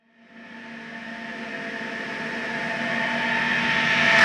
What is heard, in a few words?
Riser